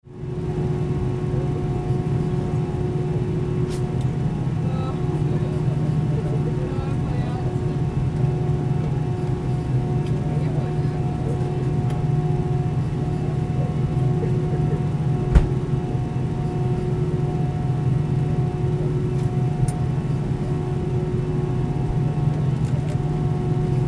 a319 jet cabin inside befor take off

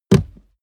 step barefoot metal jump

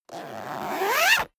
zipper down jacket close accelerate

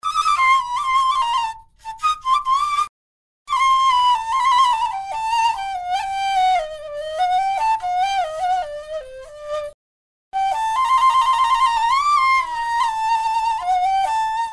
oriental flute

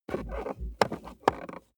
plastic plate crevice rub squeak

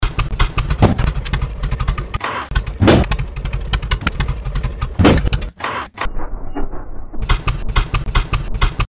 funny machine fx

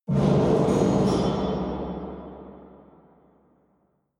metal rumble

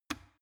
plastic water boiler turn switch on hard

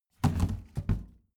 plastic trash can open heavy

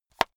watch case small close hard

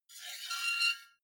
two knives large scrape creak

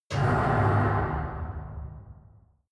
industrial hit

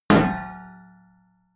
shield metal impact ring

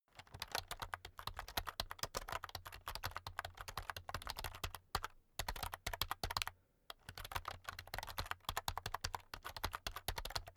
keyboard external type sequence fast soft; Computer

